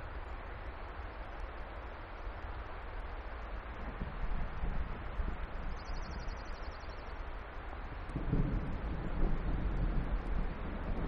Thunderstorm onset and Lapland Longspur Song montage, Alaska